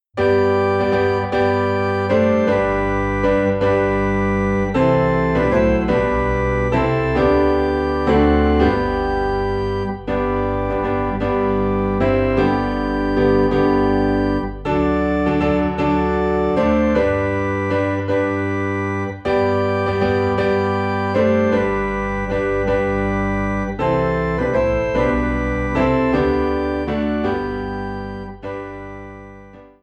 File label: Piano and Organ in G; More About Jesus